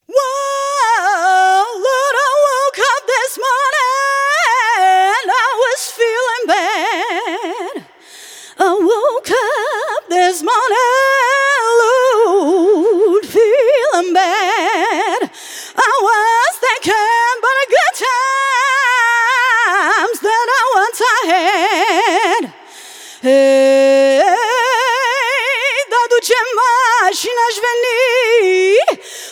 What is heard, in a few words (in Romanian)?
afro doina live; graiu